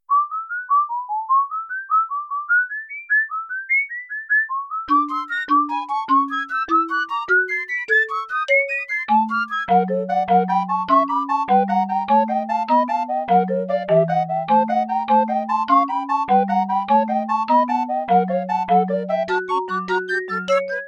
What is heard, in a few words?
Pipes and Mallets